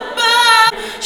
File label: VOX LOOP, Funky Vocals; USB Soundscan vol.18